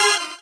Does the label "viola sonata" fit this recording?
No